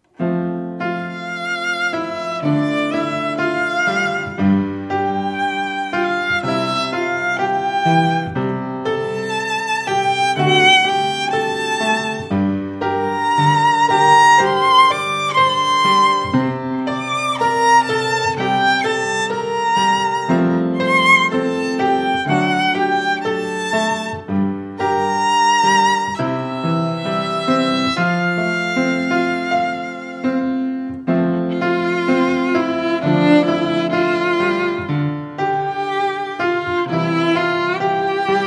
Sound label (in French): violon